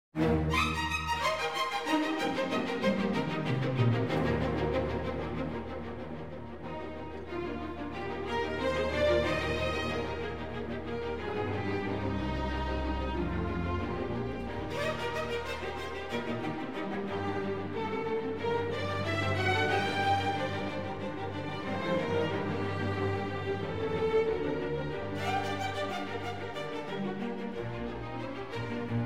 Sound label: Serenade For Strings in E-Flat Major, Allegro Giocoso